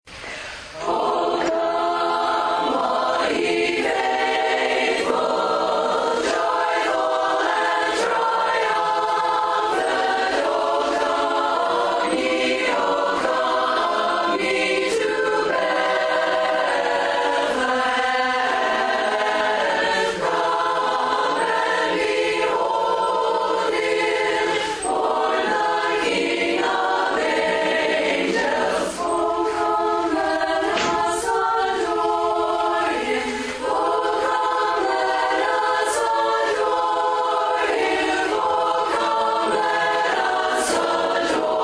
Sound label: O Come All Ye Faithful, Chorus; learning tracks